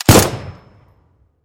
weapon; Season 2+ Default; Semi Auto

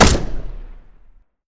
weapon; veccy!; SMG

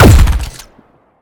weapon; KRPD Stalker

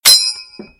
headshot; headshot kill sound

